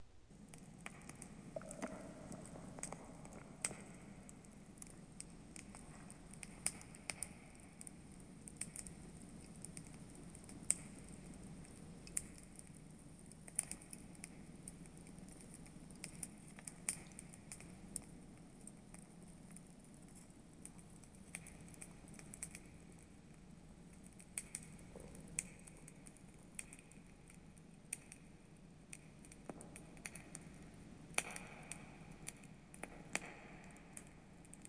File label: fire